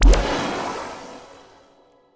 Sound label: stinger mastery